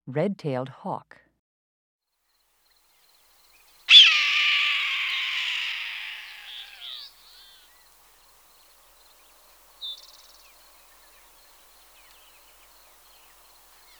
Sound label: Red Tailed Hawk